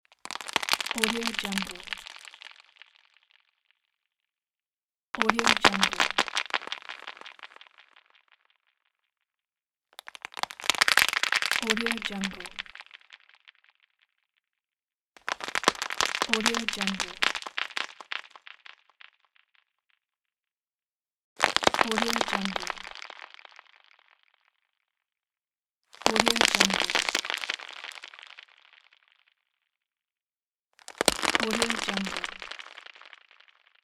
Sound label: ice transition; tineside